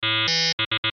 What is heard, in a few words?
Beeps Chime Signal, Electronic Device; Blastwave